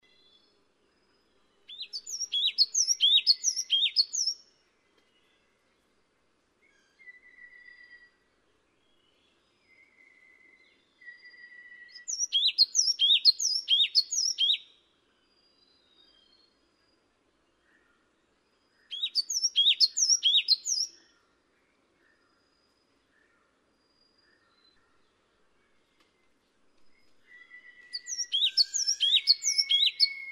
黃山雀; 山雀科/留鳥

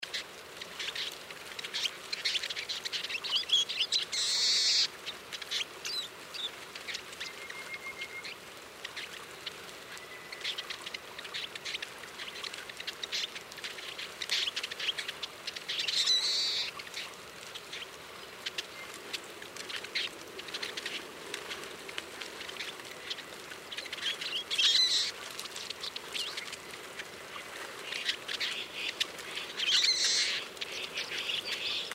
黃雀群鳴; 雀科/冬候鳥

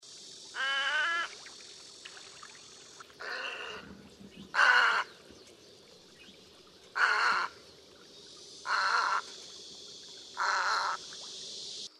小白鷺; 鷺科/留鳥

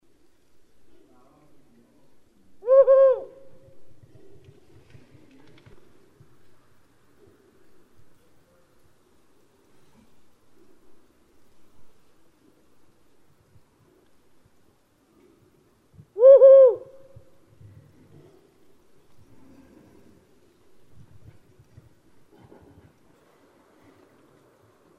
灰林鴞; 鴟鴞科/留鳥

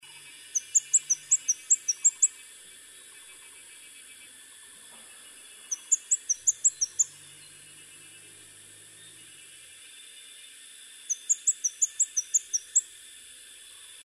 綠啄花; 純色啄花鳥; 啄花科/留鳥

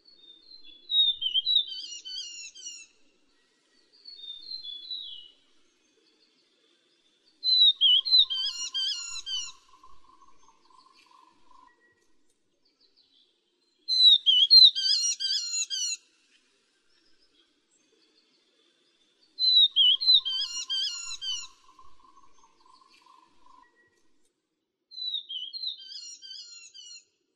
繡眼畫眉; 灰眶雀眉、繡眼雀眉; 畫眉科/留鳥

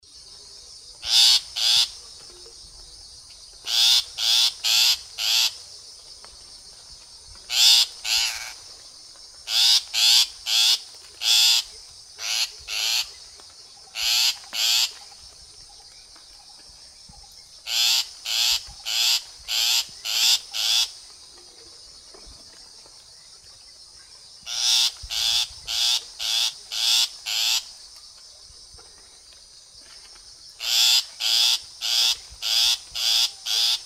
棕背伯勞; 伯勞科/冬候鳥、過境鳥

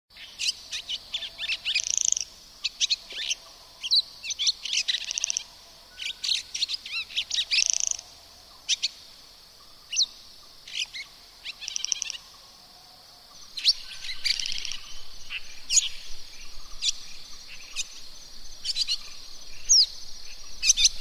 洋燕; 燕科/留鳥

